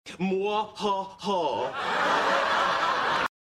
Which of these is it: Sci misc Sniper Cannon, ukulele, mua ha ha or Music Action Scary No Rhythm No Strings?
mua ha ha